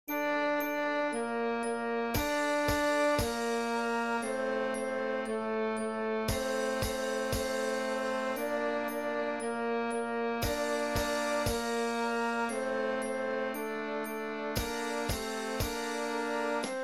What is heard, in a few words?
concert Duet medley